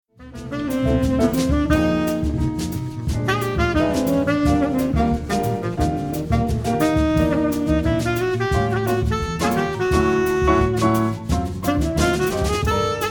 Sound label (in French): extrait bossa